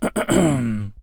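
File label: just cleared throat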